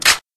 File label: 1887 pump, winchester